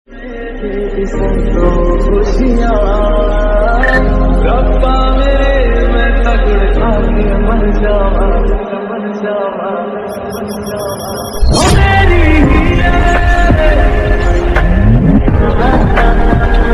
new hindi love ringtone

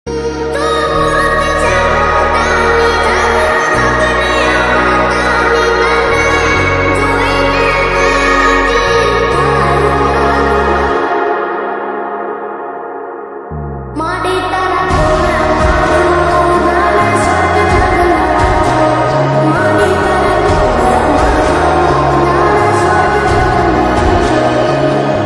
gujarati ringtone

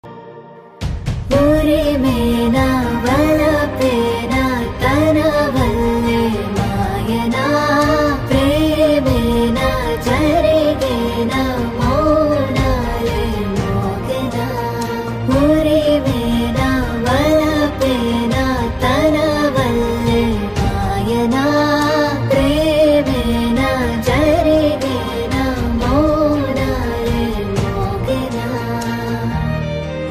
song female version; dear kavya webseries

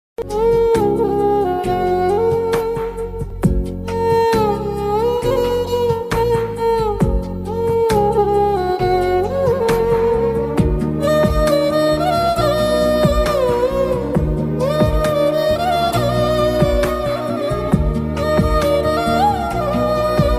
movie bgm sad bgm; vaana movie

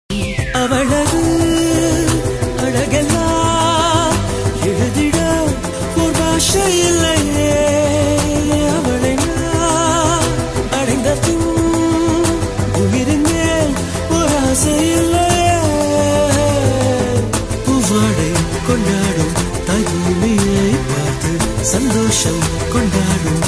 bgm ringtone tamil love ringtone

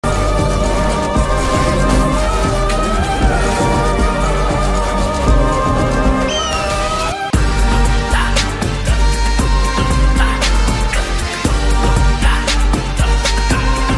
bgm remix; thalapathy vijay; venkat prabhu

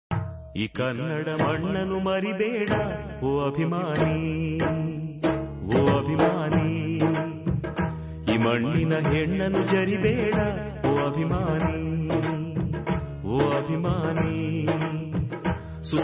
Kannada song; Kannada Rajyotsava

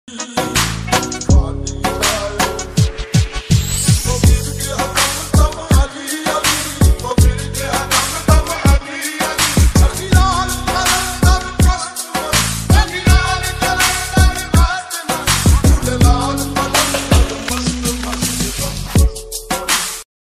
qawwali ringtone

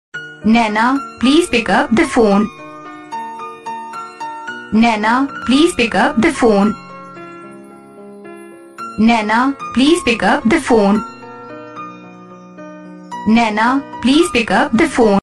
naina name ringtone

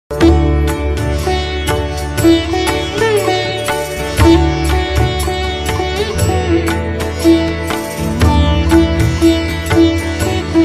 gayatri mantra instrumental ringtone

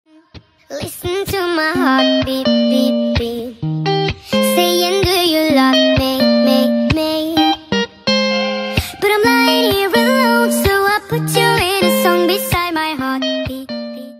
ringtone heartbeat song

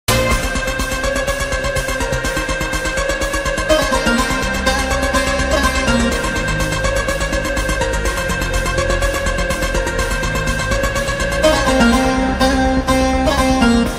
mass bgm ringtone; kgf

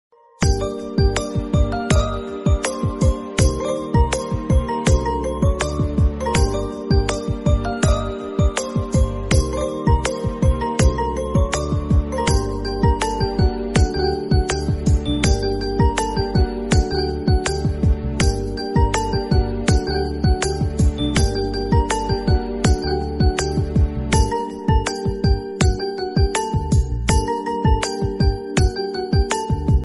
theme music

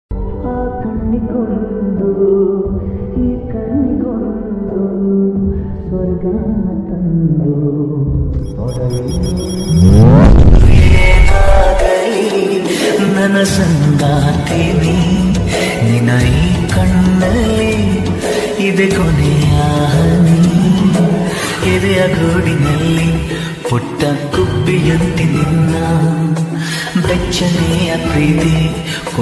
kannada love song

